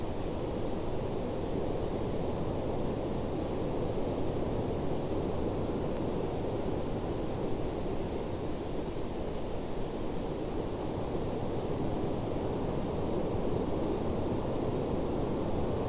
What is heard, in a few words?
WFR audio emfisis